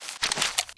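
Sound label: Desert Eagle deploy; czero opforce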